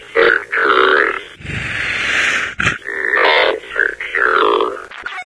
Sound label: zombine idle; npc; source engine; episodic